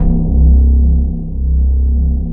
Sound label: BASS 170 BPM, Drum & Bass; Ueberschall; Drum & Bass Flash Trackz